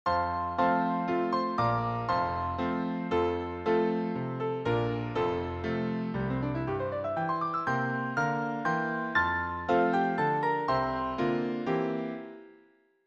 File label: Runs in Hymns example